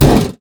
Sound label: metal impact, shield hit block